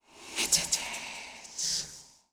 Sword idle; "Heads heads heads..."; Demoman

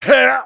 Taunt demo exert

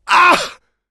Demoman pain sharp, fr

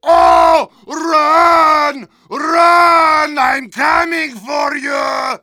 Heavy yell

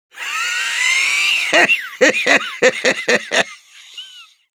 Soldier laugh long, ru; Длинный смех 2

